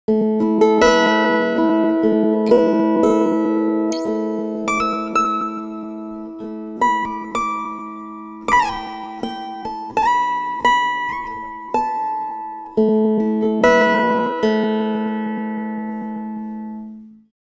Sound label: guitar, pitchshift; usb uvi workstation